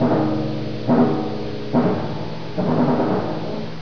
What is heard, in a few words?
timpani